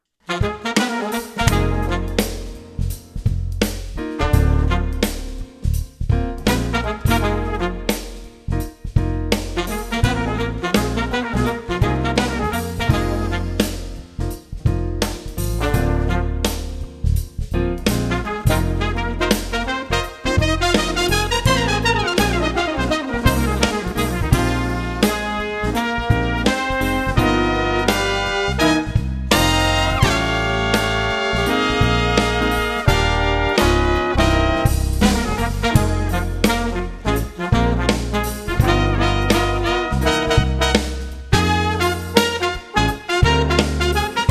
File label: Shuffle